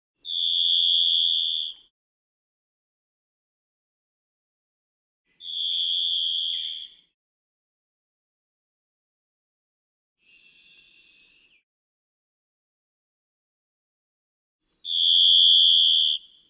thrush

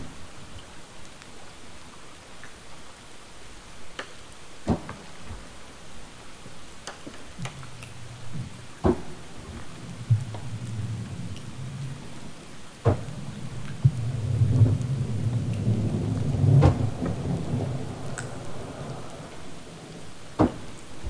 vocals pec slaps AMPLIFIED

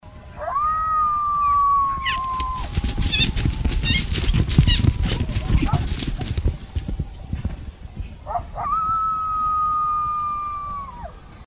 Coyotes Chase

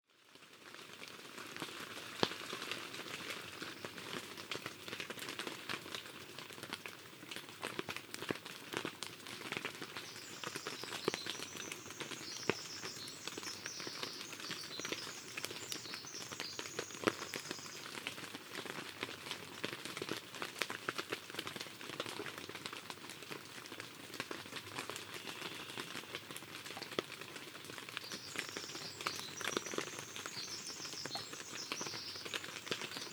raindrops in alders

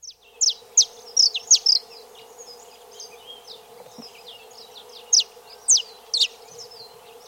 Saffron Finch

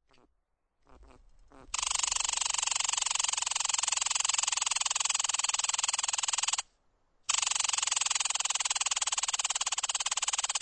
red squirrel

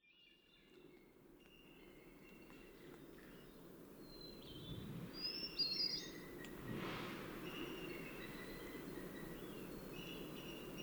thrush eagle crow and whale

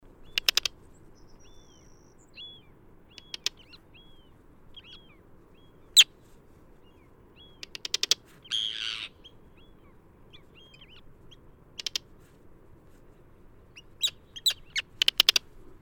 arctic tern

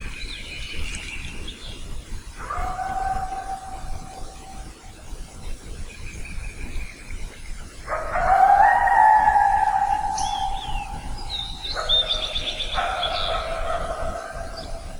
Coyote with NR

